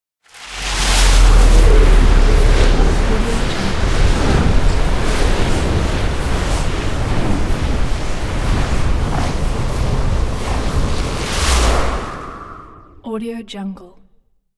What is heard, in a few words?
elemental sand cast; soundmorph